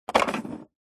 coffee machine nespresso drip tray plastic pull out